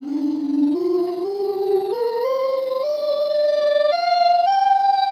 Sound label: voice C minor